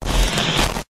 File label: Distorted glitch